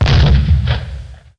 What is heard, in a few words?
Impact; Falcon 40